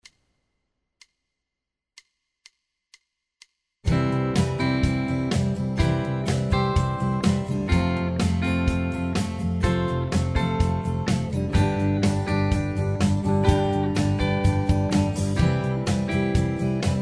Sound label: simple caged chords